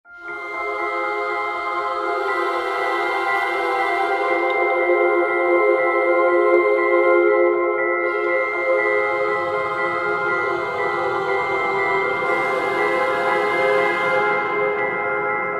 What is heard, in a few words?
creepy gothic horror